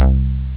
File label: Soft Bass